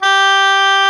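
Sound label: G4 hard vibrato, oboe; Northstar - New Gold VOL-2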